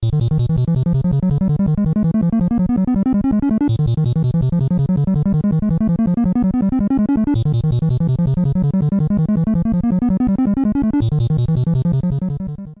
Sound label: Pinball BGM